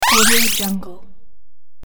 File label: magic teleport